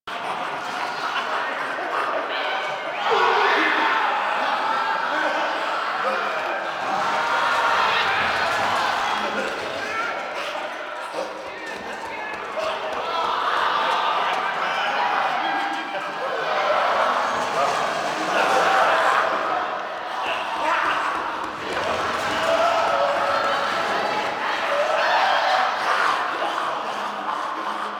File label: Destroyed Class Room Chaos Loop; Warframe; The New War; Zariman